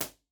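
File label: tom b impulse response, studio live room drum set; genesis 6 studio live room drum set; b-format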